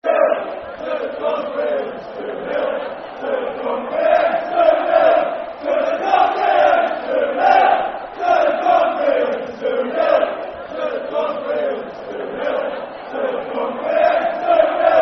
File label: 2 Nil To The Cumbrians Football Chant (Soccer Songs); Carlisle United; fanchants-com